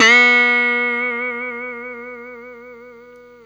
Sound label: BLU BEND UP, Guitar & Bass; 90 s Sample CDs; Quantum Leap - Guitar & Bass